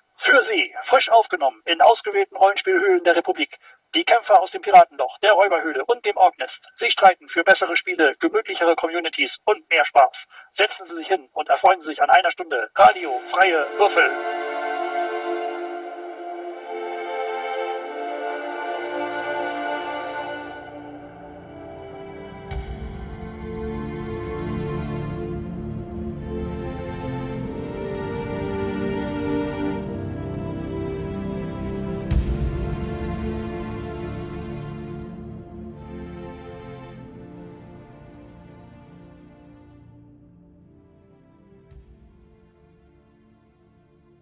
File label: jingle